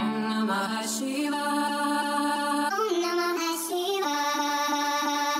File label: Om Namaha Shiva, vocal loop; instruments/loops/vocal